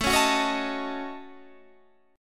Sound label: A 6th Flat 5th, Guitar, Drop D; 19,16,16,18,16,17